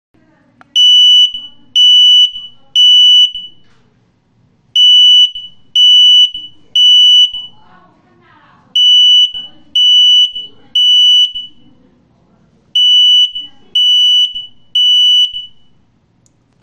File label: Alarm, rauchmelder